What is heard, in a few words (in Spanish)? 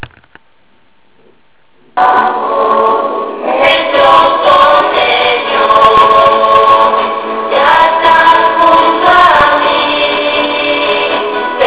coro juvenil